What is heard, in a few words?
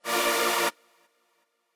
chord